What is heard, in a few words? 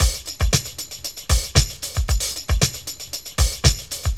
tambone, drum loops, funky